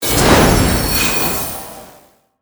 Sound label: Lightning Storm Cast